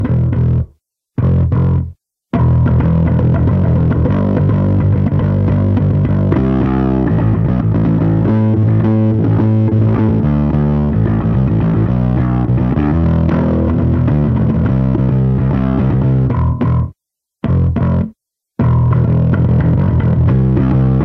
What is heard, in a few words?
bass